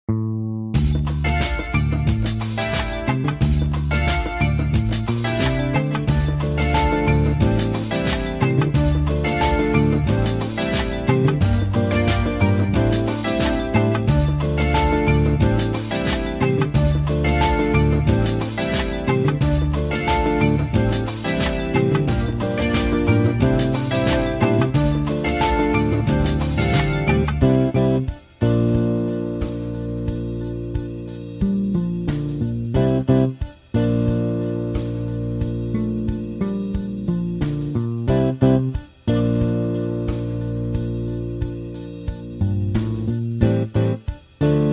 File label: easy streets backing track